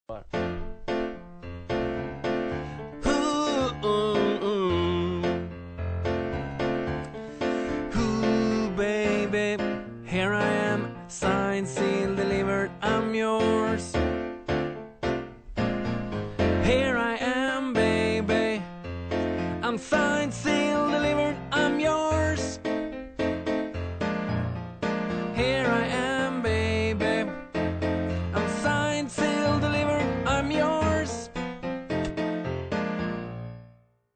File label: tenor